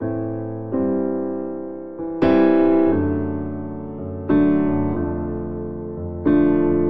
Progression Rock Walkdown A Minor 116 bpm, Grand Piano; MIDI Clips; Ableton; Factory Packs